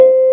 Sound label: KARIMBA C5; Big Fish Audio; Synth City